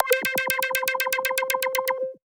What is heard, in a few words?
C5, SynthLine; 90 s Sample CDs; NiceBeats; SynthLine VOL-14